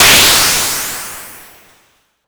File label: Massive Cymbal, Cwejman S1